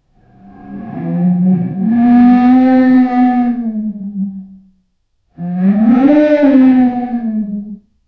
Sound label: the polar bear roar, arctic